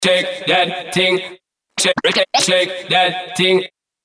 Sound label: SCRATCH 99BPM